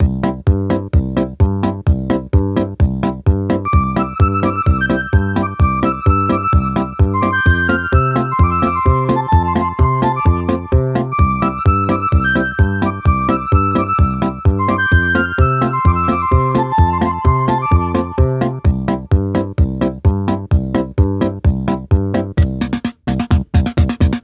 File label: CASIO Slow march; Wunderling